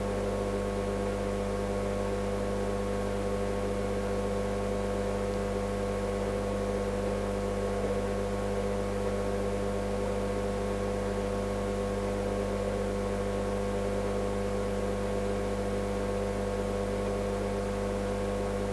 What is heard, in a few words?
recording powerline intercom; with audacity